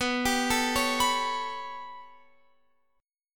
B 7th Suspended 2nd Sharp 5th down Guitar Open F, chord; B7sus2#5; arpeggiated